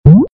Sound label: mario jumping